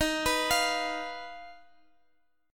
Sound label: Eb Suspended 2nd Sharp 5th, Guitar, Open G